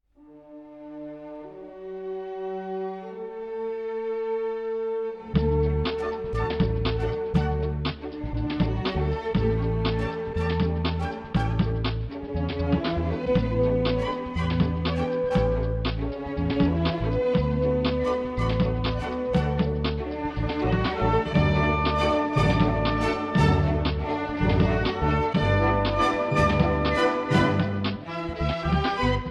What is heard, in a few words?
Blue Danube 120bpm